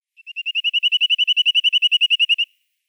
Korsenkleiber Sitta whiteheadi, Vogelstimmen

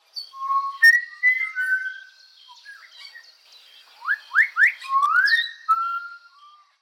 Beo Gracula religiosa; Vogelstimmen

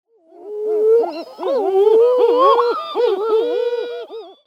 Grosser Sturmtaucher Puffinus gravis; Vogelstimmen

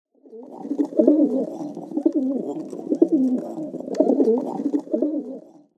Strassentaube Columba livia f domestica; Vogelstimmen

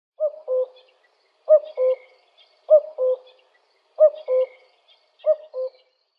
Kuckuck Cuculus canorus, Vogelstimmen

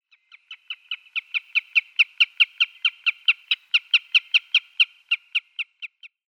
Dunkler Wasserlaeufer Tringa erythropus; Vogelstimmen

